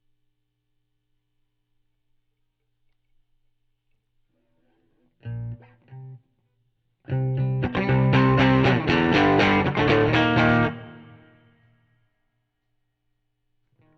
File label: GTR